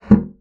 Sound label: bassoon slap; Xsample VOL-6; C BASSOON